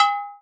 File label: sfx perc